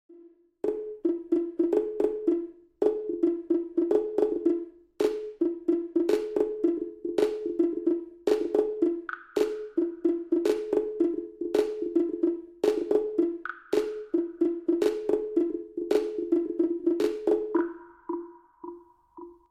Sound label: Percussion, Ringtone; S7 Samsung